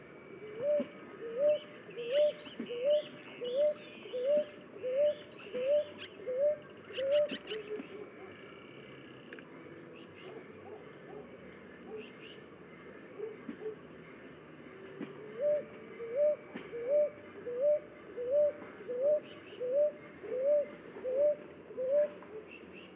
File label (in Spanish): Columbina talpacoti Columbina colorada